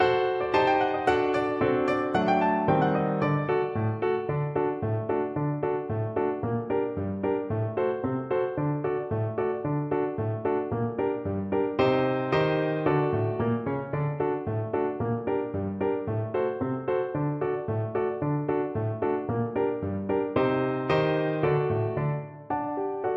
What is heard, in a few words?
unsertoirele, Flute